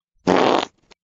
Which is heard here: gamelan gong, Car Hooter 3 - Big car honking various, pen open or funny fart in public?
funny fart in public